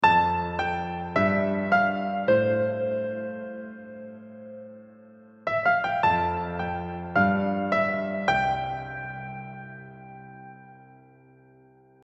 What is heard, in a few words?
Chorused Piano